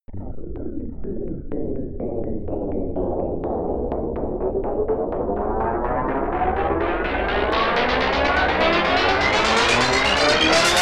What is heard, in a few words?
tape machine noise spin up, Risers